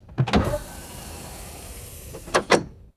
car trunk opening with pneumatic struts; vehicles

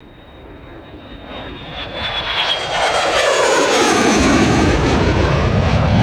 jet aircraft low fly by with whistling whine

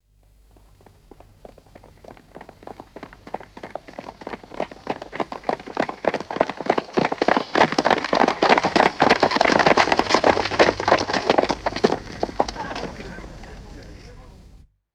multiple people running and stopping on dirt; foley

